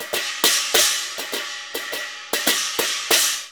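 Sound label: CHINA, China cymbal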